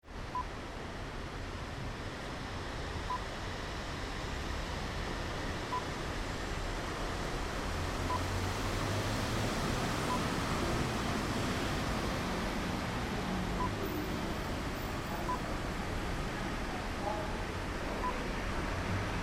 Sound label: Busy Multi Story Car Park Shopping Centre